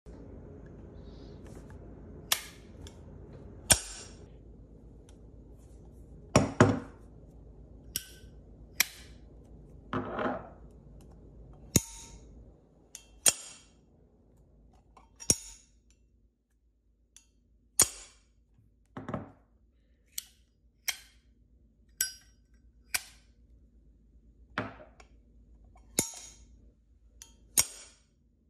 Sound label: zippo lighter; فندک زیپو